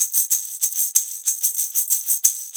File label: Metal Morraccas, Perc Loops; Sonic Foundry; Loops & Samples Drum Tools